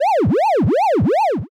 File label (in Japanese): guruguru